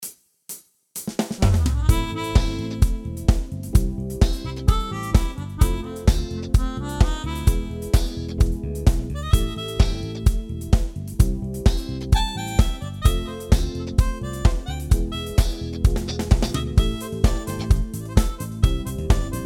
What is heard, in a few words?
Disco; AiR Symphony